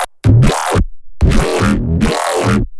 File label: Neuro Bass Stab